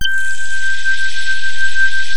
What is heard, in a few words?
RAINMAN; NorthStar - The Wizard VOL-2